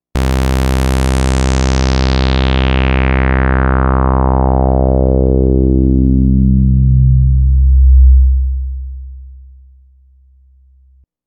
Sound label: OSC Saw LP 50 Resonance